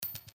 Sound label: Coin; The Adventures of Puss In Boots - Catch The Thief; NextlevelGames